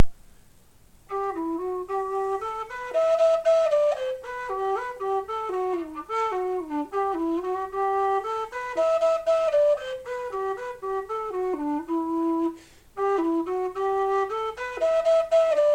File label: jig; kelly's jig